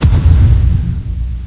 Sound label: Step; schweif